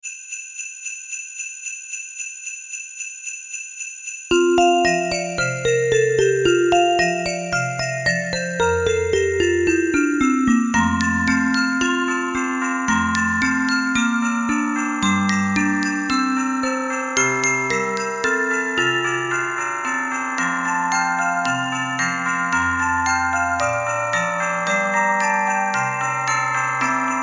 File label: jingle bells